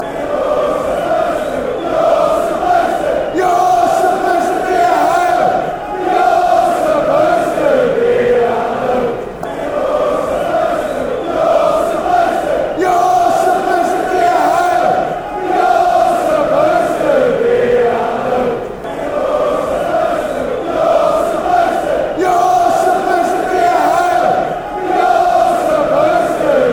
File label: youre supposed be home